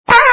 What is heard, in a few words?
fart